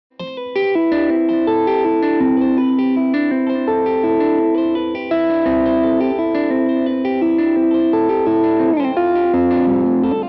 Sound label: harp Tap